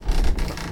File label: door creak, household; NS Studios - ultra sound collection